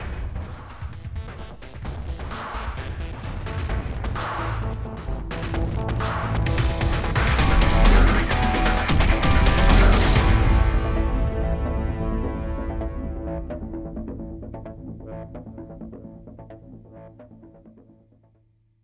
Music Storm Event; Worms Rumble; PS4 PS5 Switch Windows Xbox One Xbox Series XS

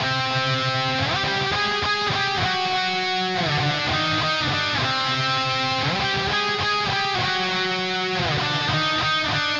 Drums and Jingles Evil Lead; Metal Tales Fury of the Guitar Gods

